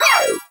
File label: slide; cookie run